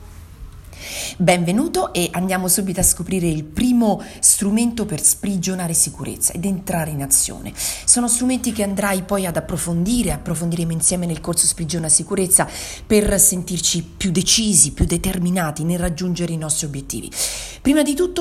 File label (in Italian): strumento